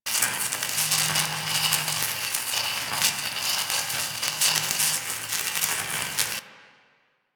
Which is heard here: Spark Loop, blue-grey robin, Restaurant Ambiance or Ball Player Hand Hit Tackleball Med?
Spark Loop